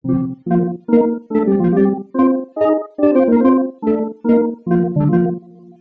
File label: GameCube BGM